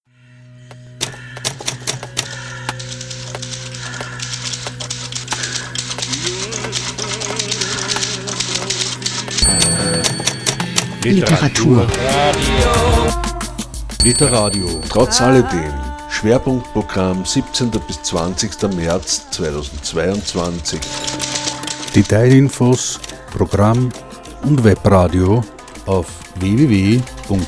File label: jingle